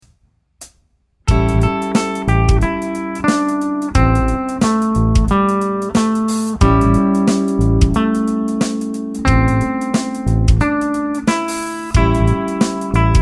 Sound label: solo